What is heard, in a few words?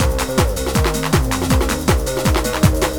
drumloop; awe32